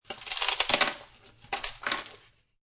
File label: Ice Cubes Twist Ice Cube Tray Fall Onto Kitchen Worktop; Drink Mixing